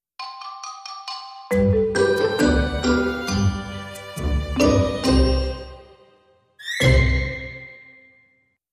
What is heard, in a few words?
Creaky Door